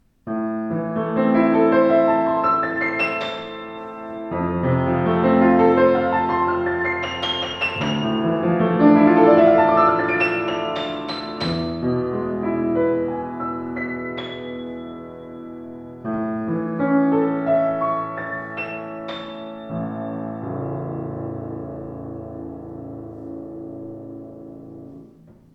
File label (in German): Schimmel Buche Kreuz, Klavier; 115 schimmel buche